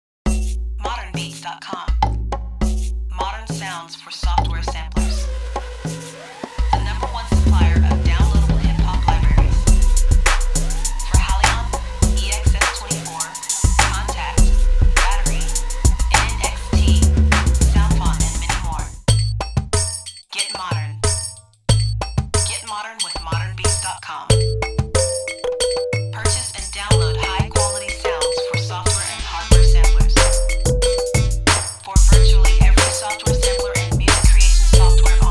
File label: Urban Percussion Loopz